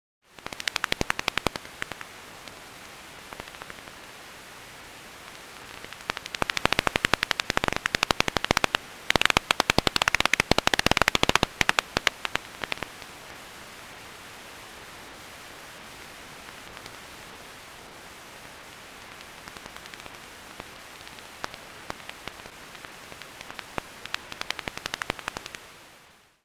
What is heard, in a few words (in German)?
Wasserfledermaus, Fledermausrufe